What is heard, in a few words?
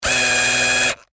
Industry Electric Drill Tighten Screw Whine Stress Short